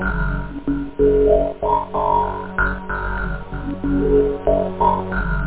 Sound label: digitall, loop; da capo vol1